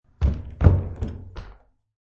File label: Footsteps on wooden stage and down stairs; Human